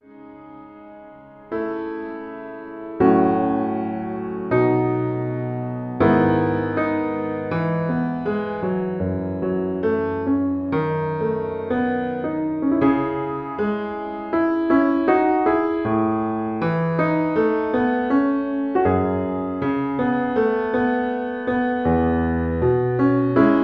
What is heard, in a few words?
intermediate piano solo